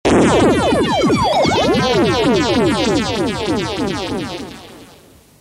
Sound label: Stinger